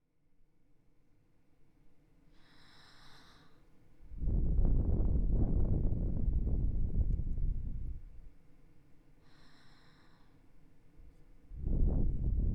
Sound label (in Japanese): 耳ふーはー 左